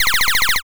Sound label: rewind